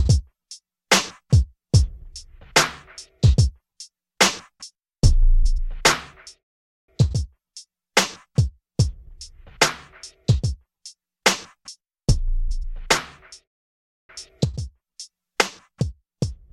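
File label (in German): Verschiedene Kompressoreinstellungen, Soundcraft Si Impact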